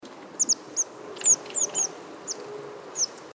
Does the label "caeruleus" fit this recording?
Yes